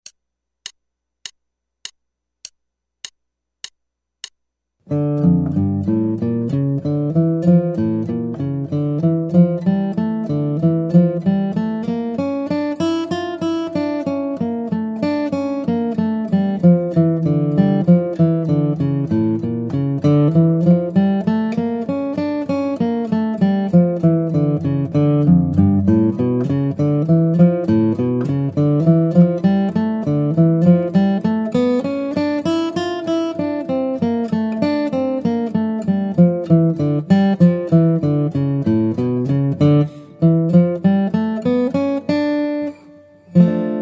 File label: Guitar